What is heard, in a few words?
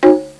conga drum; Sound Effects for Multimedia - Volume 1